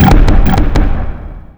Present Box

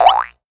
Hopping Duck run

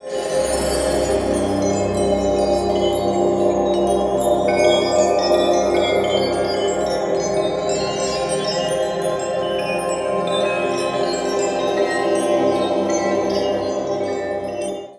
Jewel

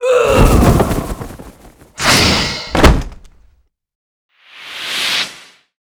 Die; Salare; Seer; GamigoNA

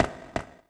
Mara Sailor Run

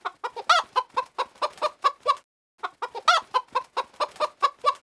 chicken; Seer; GamigoNA

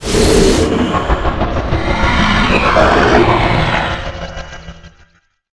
Fire Pamelia Die; Seer; GamigoNA

